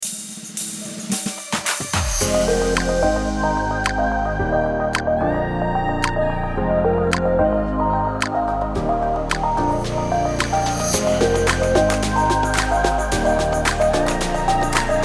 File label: fashion upbeat corporate advertising music